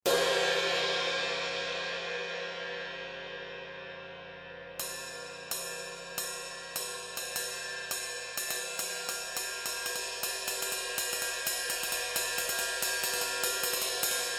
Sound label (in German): Becken